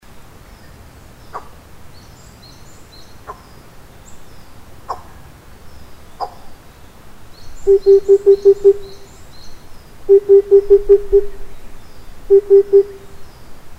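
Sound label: tsutsudori, Oriental cuckoo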